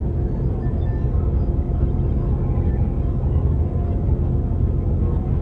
Ambient Sludge; The Official Guide to Babylon 5

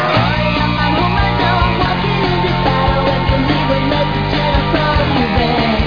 BARMUSIC

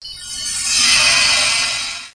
jammed

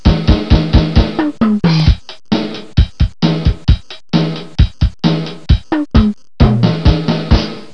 dance; My Science Fair

